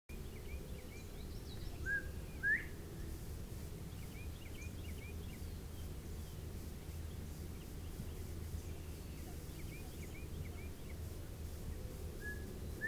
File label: Northern Bobwhite; birds; Indian Springs; The Birds of Indian Springs; audio cassette tape